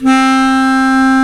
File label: Bs Clarinet, Orchestral Winds